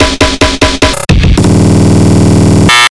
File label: Loop